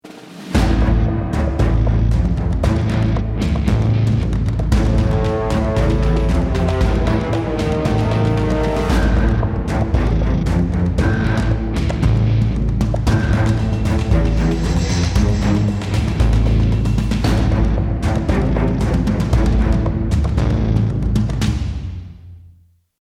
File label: start round